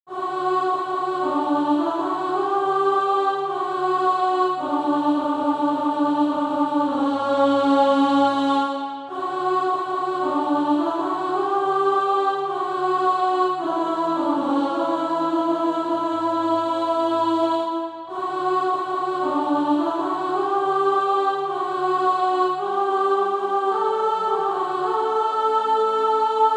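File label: alto, Practise Music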